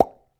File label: Pop